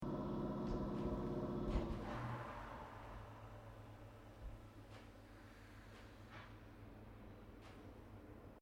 Train JR Regional Aomori Local Connecting Platform Shutdown, Japanese Trains; Vehicles